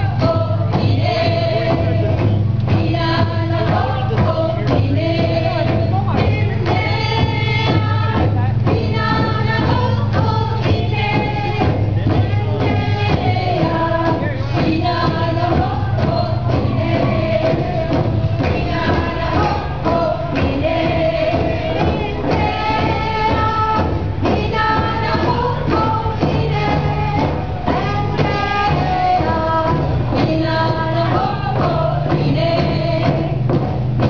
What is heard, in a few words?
Drum Singers, Aboriginal